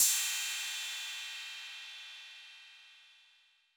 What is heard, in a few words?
Cym B 808 Sat Decay B, Cymbal, Multi Saturated